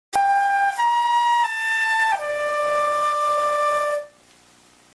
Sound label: Whistle (Flute cover) Notes